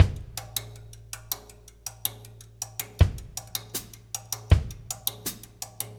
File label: Russ Loop, Laidback; Sonic Foundry (Sony Creative Software); Drum Tools